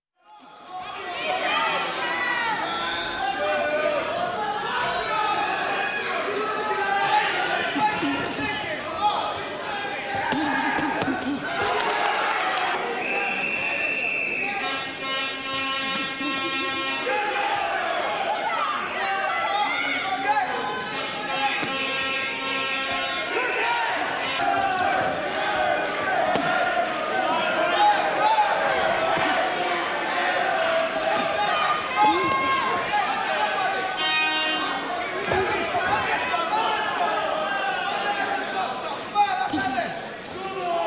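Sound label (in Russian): толпа на боксёрском поединке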